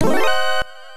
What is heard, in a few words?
Alert; Metal Gear 2: Solid Snake; MSX